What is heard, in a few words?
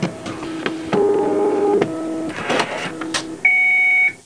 fax; MOBICLIC 9